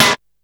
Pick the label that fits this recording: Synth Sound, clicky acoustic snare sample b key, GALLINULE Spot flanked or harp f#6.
clicky acoustic snare sample b key